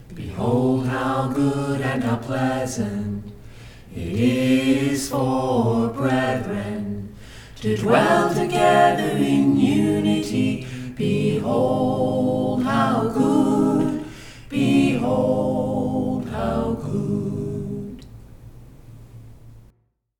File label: a capella scripture song